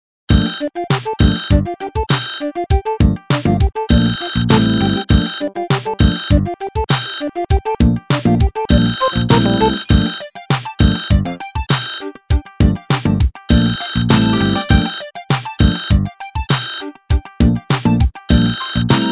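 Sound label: jig, Klingeltöne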